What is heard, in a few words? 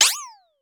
Comical Pop and Swirl; 1001 Sound Effects